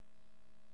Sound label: the silent ringtone